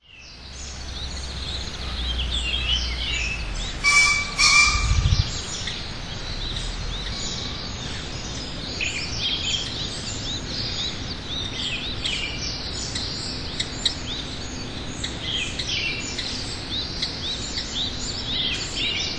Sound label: green pheasant